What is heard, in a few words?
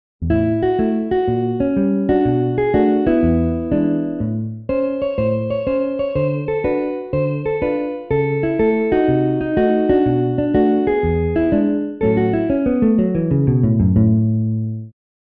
overdrive wet